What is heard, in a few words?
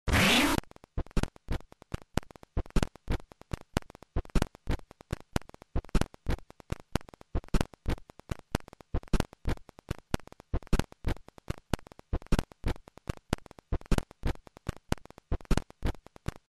scratch